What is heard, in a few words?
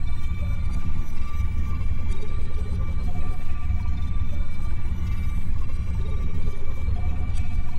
Originium Arts large loop